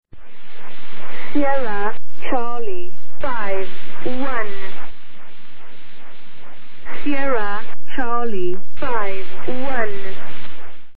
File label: wahwah